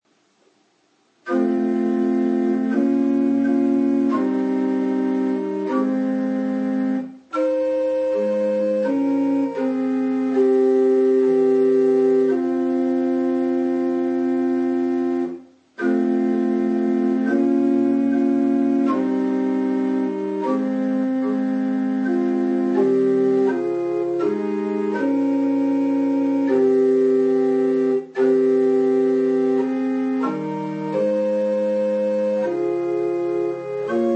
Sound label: Hymn Ah Holy Jesus